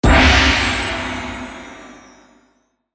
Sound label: China Gong Selection Medium